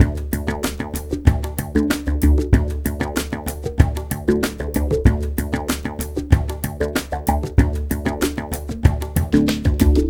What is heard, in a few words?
ABO WDMIX, Liquid Grooves; Spectrasonics